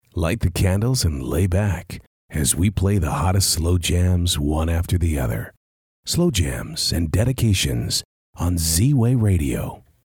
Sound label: jazz